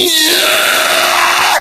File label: rage at victim, infected, male